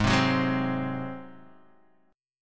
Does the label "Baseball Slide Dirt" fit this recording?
No